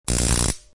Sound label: zap